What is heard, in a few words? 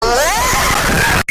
bass boosted scream